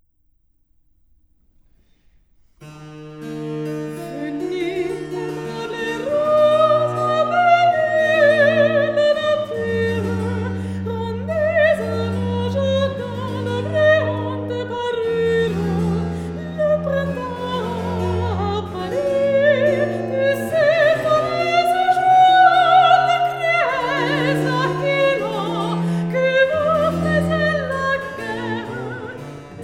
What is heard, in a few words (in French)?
Recitatif; La Rose